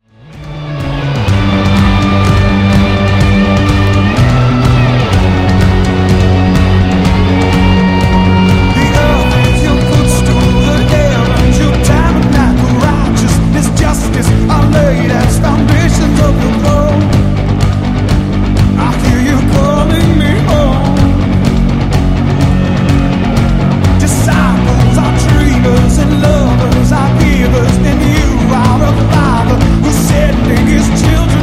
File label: War Drums